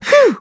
surprised; Scrat

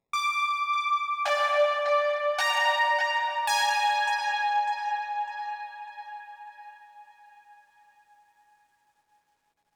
Oneshot Synth

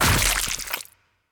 Gore Explosion; Minigame 2

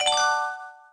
Correct

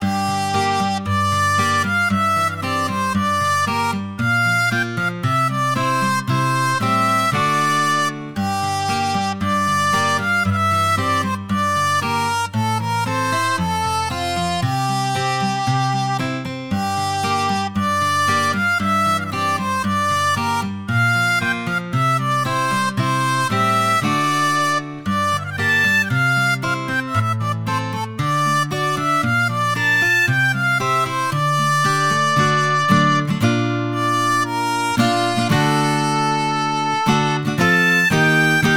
bgm grave

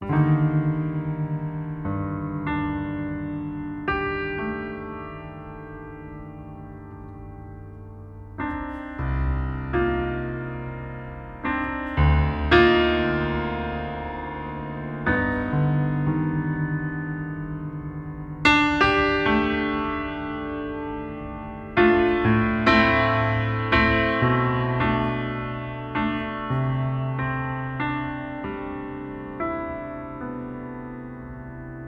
Piano w delays and fades; The Detail ios linux macos windows gamerip 2014

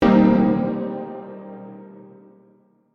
Piano hit; Among the Sleep

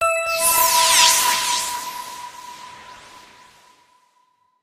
level up jingle

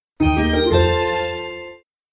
Game Clear; legend of zelda a link between worlds

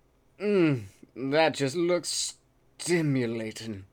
Male Reaction

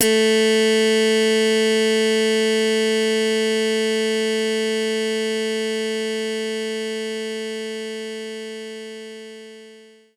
Slap Bass A4

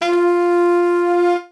Sax tone; lego my style preschool

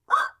buzzer startle

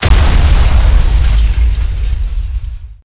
Car Explode; Nicktoons Nitro; arcade

